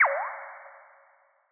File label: water